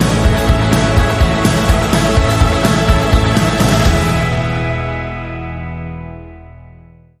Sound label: Bumper